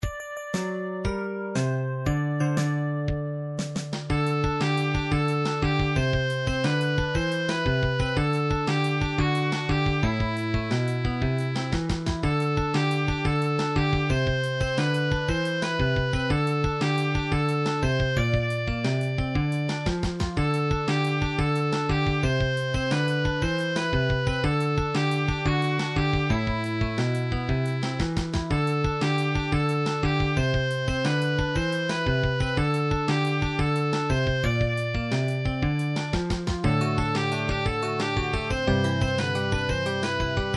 battle victory jazz remix; nintendo final fantasy

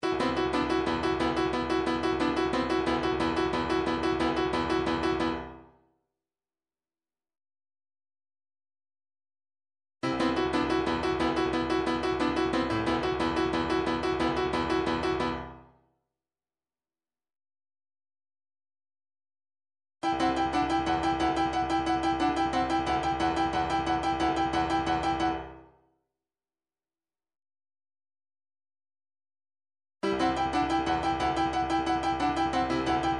midifiles various beats 180 bpm; starter

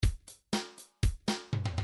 rock, midi; Rock 1

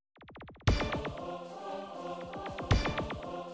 spaceship; game system super nintendo; sequenced; lost vikings; sephiroth3

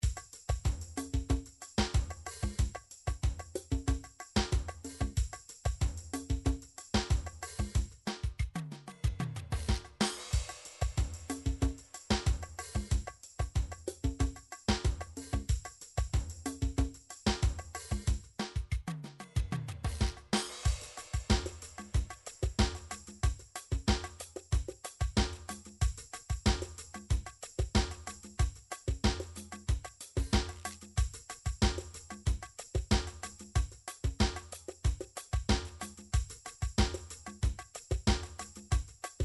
drum samba

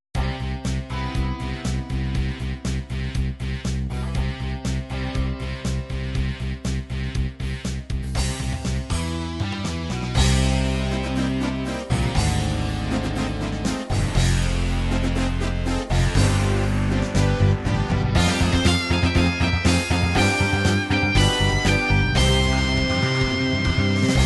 rock hardrock, midi

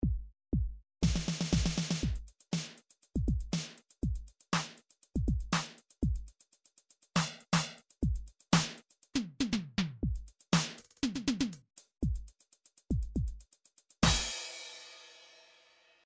midi drums electro

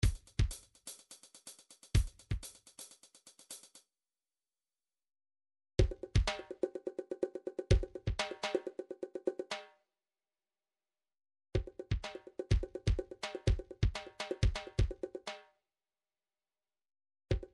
drums, midi; Bruford Midi Drums

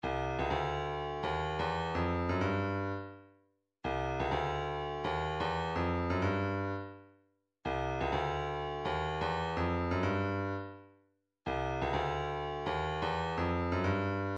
126 kick, midi; East End

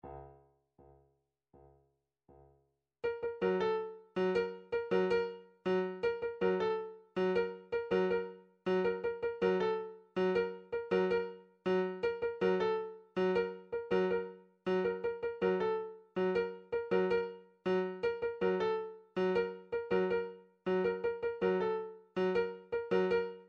drum trax per

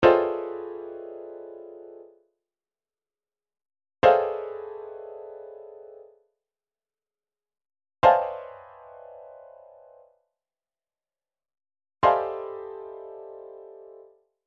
chord lines F#, midi; Midi Files 1000; Expert F

